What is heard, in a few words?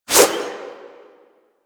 Proj Throw; Army Replicant; Warframe; Hoplite